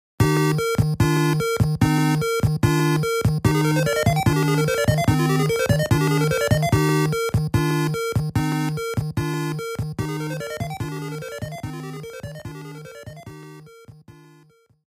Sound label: Big Hit Lightweight